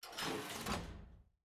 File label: Lobby Room Door Close